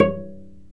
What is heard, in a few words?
STR VC PIZ, Chamber Pizz; Roland L-CD702